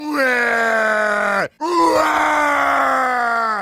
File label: voicelines heavy crying